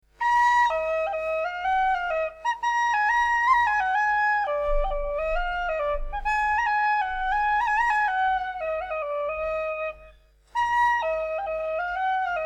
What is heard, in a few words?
homemade whistle test